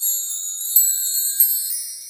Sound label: Stone